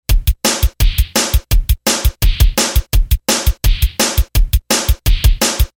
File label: drum